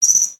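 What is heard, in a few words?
Pets Insect Large Speak; creatures; Lego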